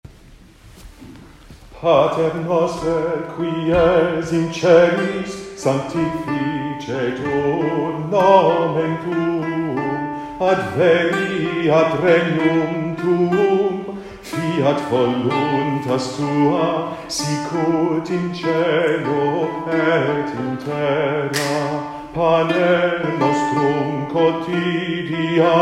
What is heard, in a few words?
Alto Bass; Pater Noster; citysings